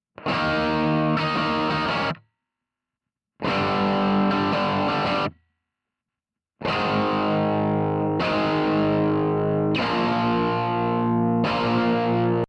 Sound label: guitar